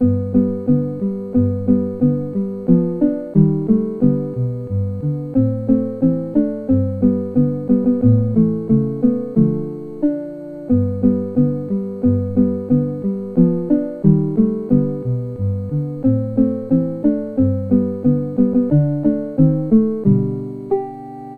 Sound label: bells